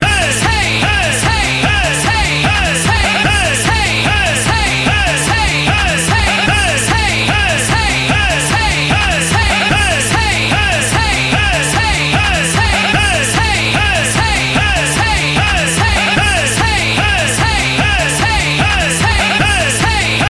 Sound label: loop